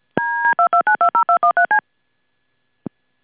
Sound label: DTMF